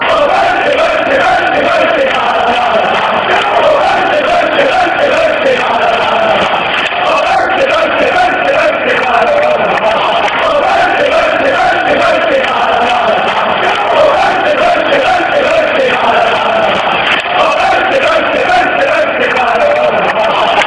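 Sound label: bouncy bouncy, football songs and soccer chants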